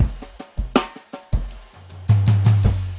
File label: 160 live jungle, breaks